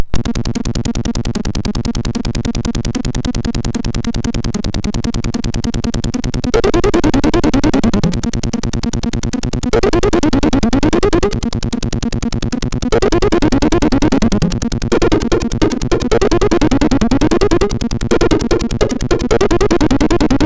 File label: sid MOS6581R4